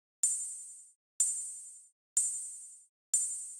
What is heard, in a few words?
ride; DreamBall; third level